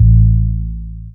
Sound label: sine, synths; Notes